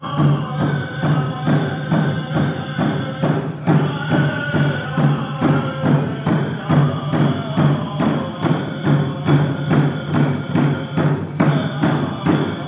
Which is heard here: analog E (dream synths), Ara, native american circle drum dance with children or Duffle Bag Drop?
native american circle drum dance with children